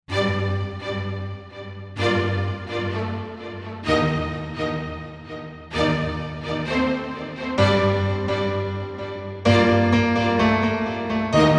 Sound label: Clubstep